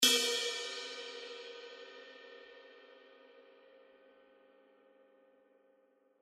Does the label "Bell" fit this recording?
Yes